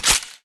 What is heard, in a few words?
bolt release, ak74; weapons; garrysmod